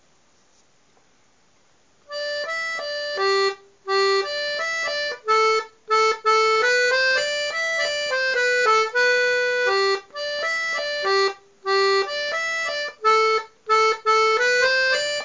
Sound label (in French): Mazurka main droite lent